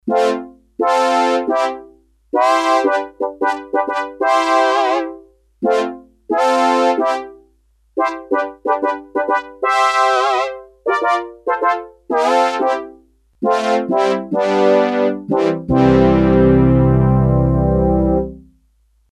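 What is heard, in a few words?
Brass Ensemble, Korg DS-8; Factory Patches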